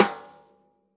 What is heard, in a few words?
natural sd rim on; single hits